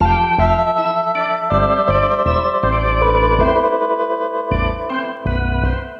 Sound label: Classic Melody Loop - 160 BPM A Min; Cymatics; 2020 Melody Collection